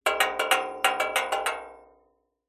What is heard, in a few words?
foley metal thin object short rattle clatter larger metal frame